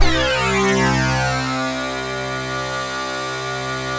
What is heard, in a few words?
BRITE SHINY; Greytsounds; Scott Peers Sound Engineering